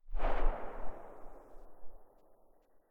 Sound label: silencer very far, weapons; vpo101